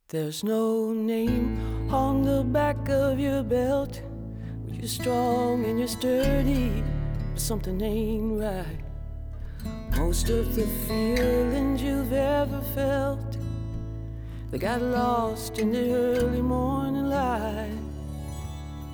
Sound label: vocal; Audio Quality Immersion Examples